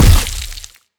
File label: poison hurt